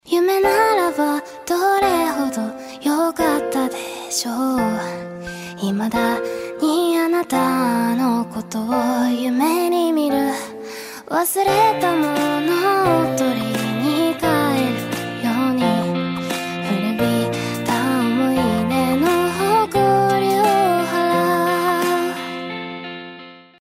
KOREAN RINGTONE

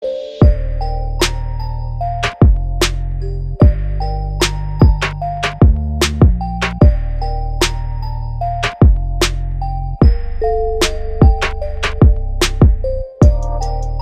deep storytelling rap beat ringtone

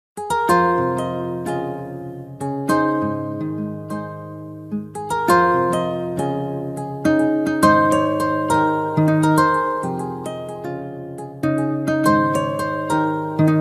POP RNB INSTRUMENTAL GUITAR

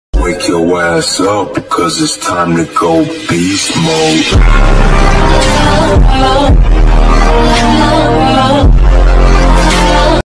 beast mode alarm ringtone

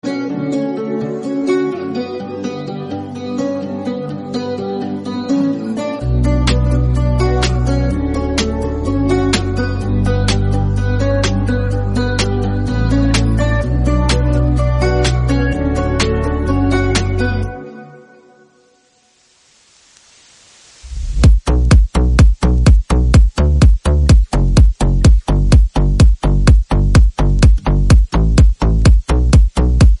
instrumental ringtone

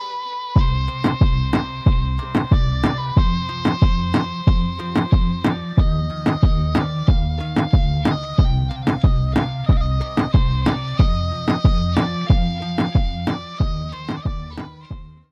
instrumental ringtone

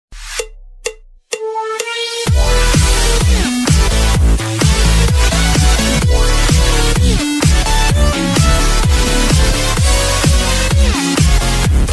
trap ringtone; AMONG US

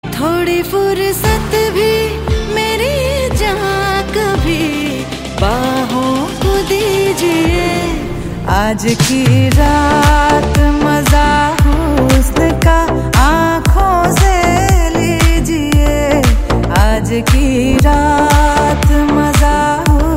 HINDI SONG RINGTONE; STREE 2

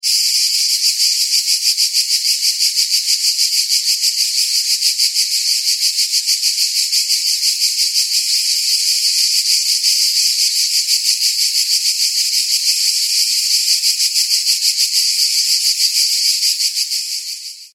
CICADA SOUND

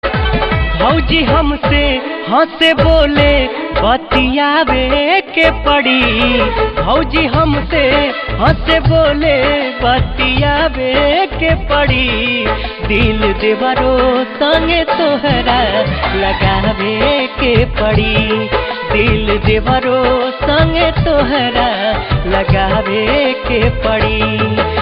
BHOJPURI RINGTONE

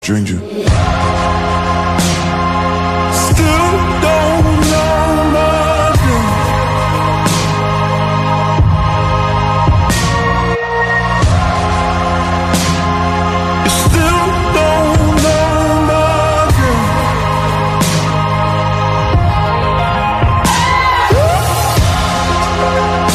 REMIX RINGTONE

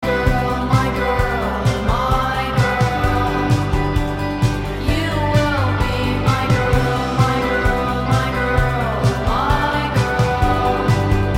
song ringtone

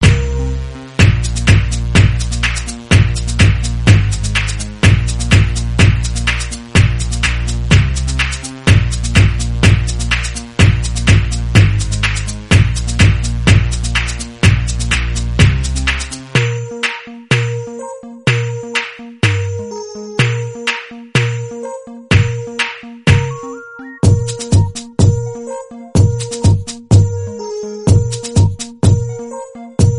INSTRUMENTAL RINGTONE

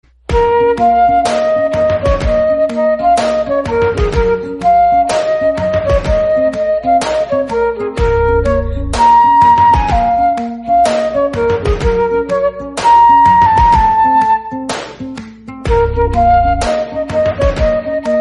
FLUTE RINGTONE

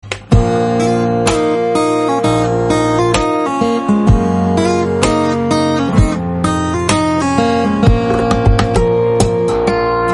fingerstyle guitar

